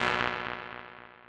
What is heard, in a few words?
Dah, techno drum fx; Single Hits